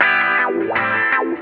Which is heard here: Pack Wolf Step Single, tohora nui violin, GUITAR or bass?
GUITAR